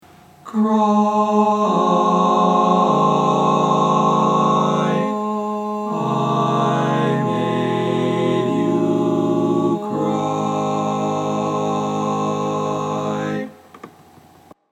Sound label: cry, barbershop multi track